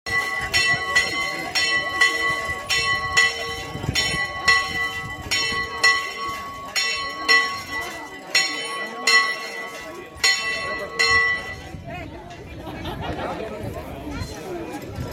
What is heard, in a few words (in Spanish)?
Sonido Campana; Ermita del Angel y San Miguel Arcangel